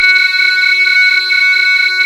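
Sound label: synth Techno bell; Best Service Gigapack 1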